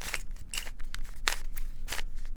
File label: Big Cube Twist, Rubik's Cube